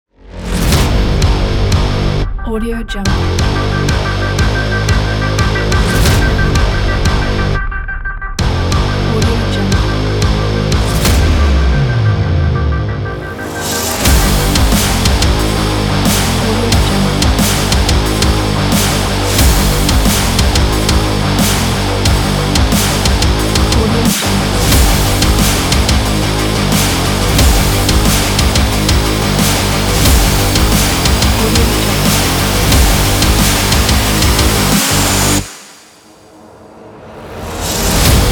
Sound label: epic rock intro